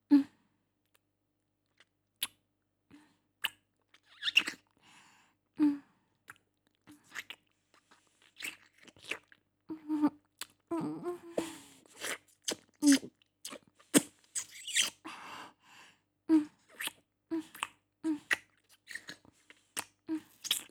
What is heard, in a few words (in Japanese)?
キス